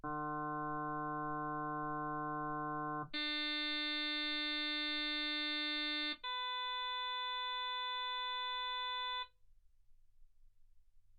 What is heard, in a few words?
harm sinus 48kHz